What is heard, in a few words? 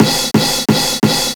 soul crashes, Breaks Loops; 'Effection' samples; lolicore